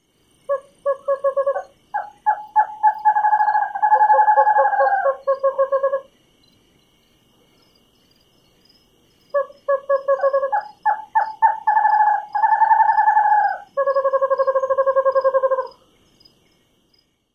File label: songs of pair